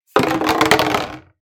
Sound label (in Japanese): ペットボトルが転がる音